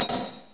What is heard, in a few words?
deiconify